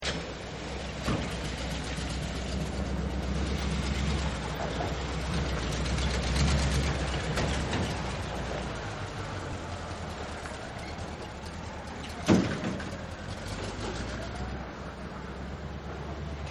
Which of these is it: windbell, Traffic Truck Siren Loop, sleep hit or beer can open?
Traffic Truck Siren Loop